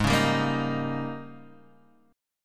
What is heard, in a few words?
G sharp Major 7th Suspended 2nd Suspended 4th Guitar Open D